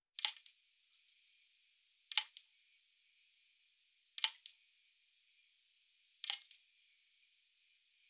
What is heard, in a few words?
Safe Zone Dripping; 1916 der unbekannte krieg gamerip